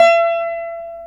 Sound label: VEENA E6; Spectrasonics; Heart of Asia